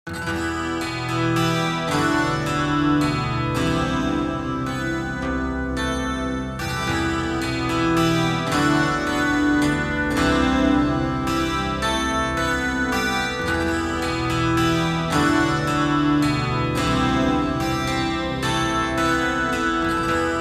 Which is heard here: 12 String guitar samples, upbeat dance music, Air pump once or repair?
12 String guitar samples